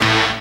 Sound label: SYN, dance; Sound & Vision - Gigapack II CD 1